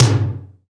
TOM2, Roland R-8

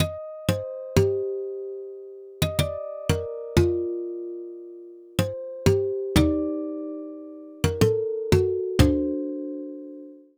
Acoustic Guitar; Sonic Foundry (Sony Creative Software); Mac Money; Guitars

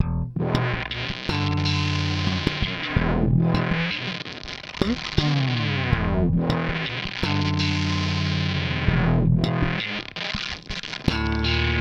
Delay Distort, Bass; Bass X

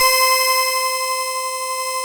PPG PLUCK C5, analog; USB Soundscan vol.49; Analog Fantasies; AKAI

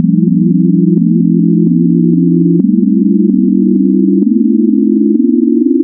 Add Partials 4N, wavetables Additive; Architecture 32

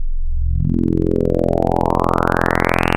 FMSweeper, wavetables; Waves Codex

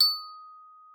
TRIS D#6, VIBES; Xsample VOL-4

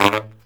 Killer Brass Riffs

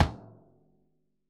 Bombo Stick Drumhead Mute; Best Service; Festa Latina VOL-1; Single-Hits

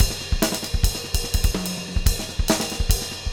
VIBE JAZZ LO, Drumscores; Steve Gadd Drumscores; GEMS; LOOP GEMS

